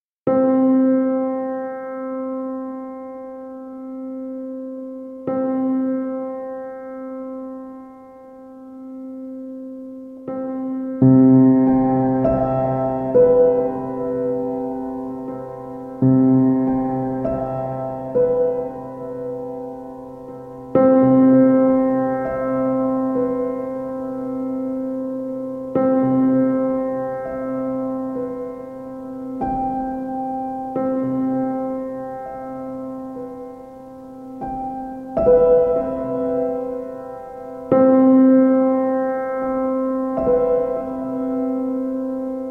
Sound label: Piano Sounds